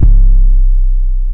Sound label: C, 808s